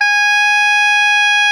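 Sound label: soprano saxophone G#4, fortissimo; Greytsounds; Philip Wolfe David Crozier's Saxophones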